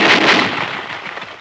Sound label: rifle